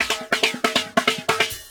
Loops Fillin140; Fills; Vengeance Samples

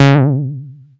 Bass Juno C#; Vengeance Samples

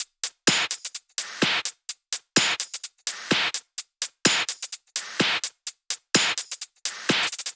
127BPM Kit; Vengeance Samples; Warhog Kit

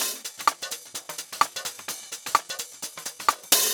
Minimal Loops

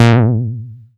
Bass Juno B; Vengeance Samples

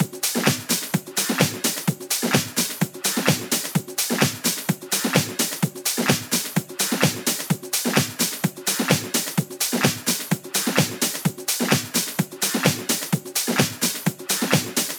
Percussive Loops 128BPM; Vengeance Samples

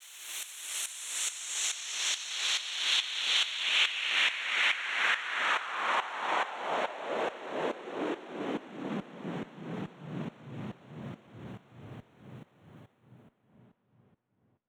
Space Of Time Kit 140BPM Long Sweep; G minor; Sound FX; Vengeance Samples

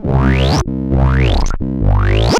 Roland C Line, Roland S100

